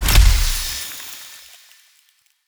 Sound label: ice prick buff